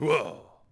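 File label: Swordsman Fort Vox; Civ4 warlords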